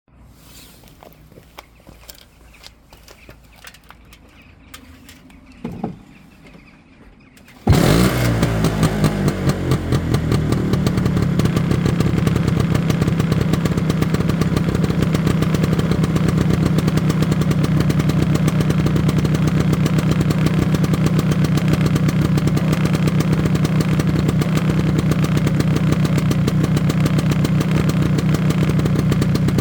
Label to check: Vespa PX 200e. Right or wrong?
Right